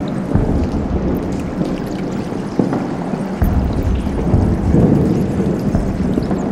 sewer; avant gardens

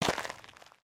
Foot Dirt Sneaker Walk, footsteps

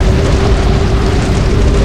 Ice Tornado Mid Loop; Spinjitsu

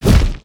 Tail Whip; creatures organic

